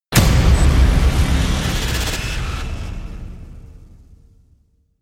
destruction slams; mechanoid

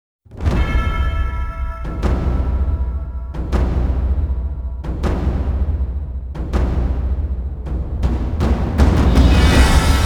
percussion only

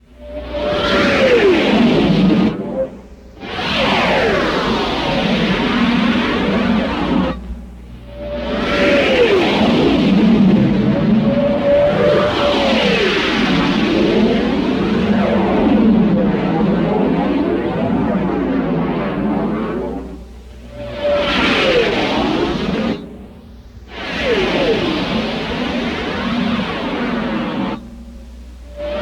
stereo fly bys; alan howarth

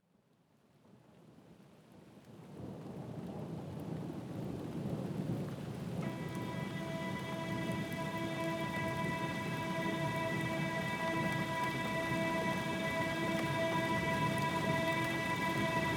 rain nature track

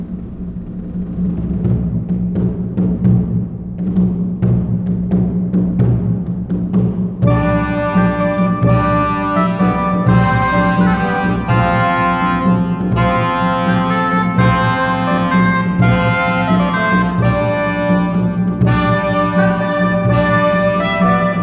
fanfare wind and percussion

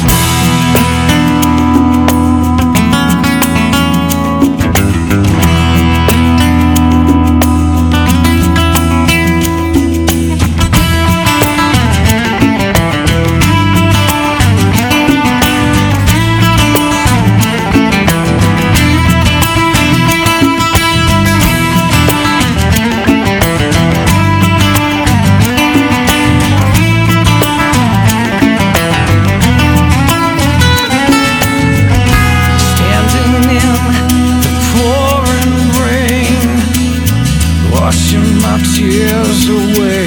acoustic version

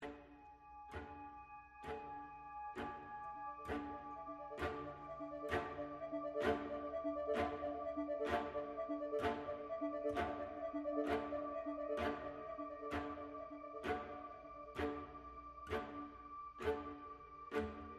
ambient

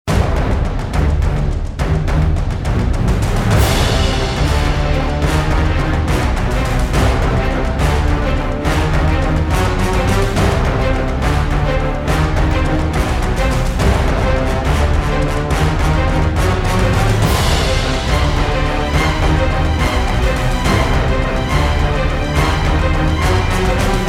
no vox no snare

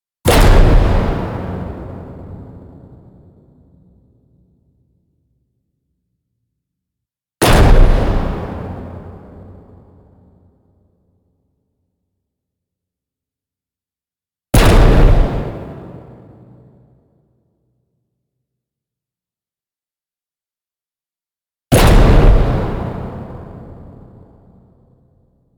hit metal shut

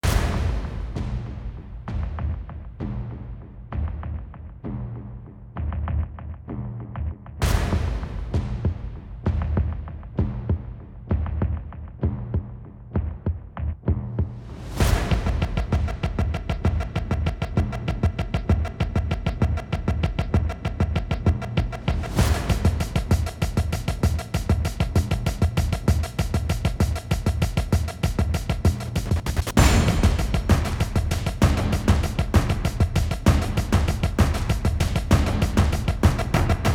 drums fx

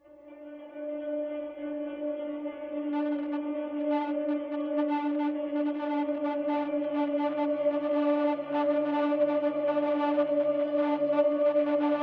no choir

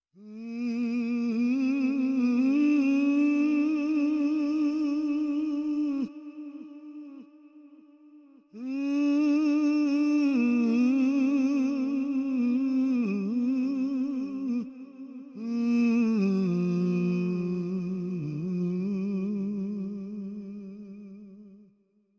solo vox with reverb

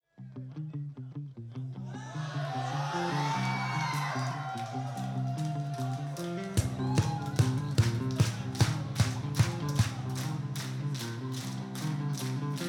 live acoustic version

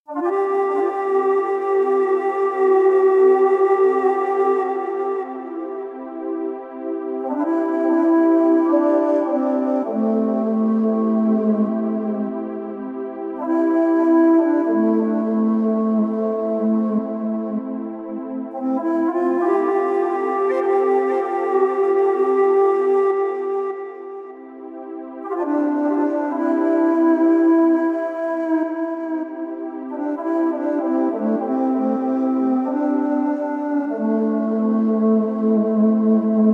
native american flute

